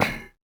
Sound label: metal grate, Footsteps